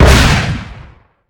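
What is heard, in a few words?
rocket launcher; FPS Game; ML-Agents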